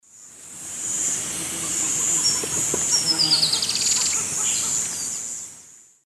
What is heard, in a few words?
Chloroceryle aenea, take-off call female; S. America; Eastern Lowlands